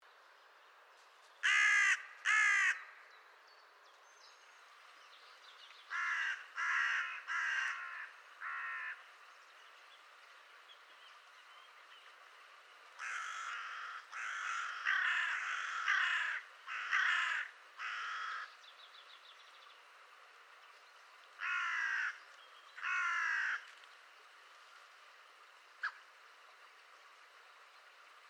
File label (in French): Corneille noir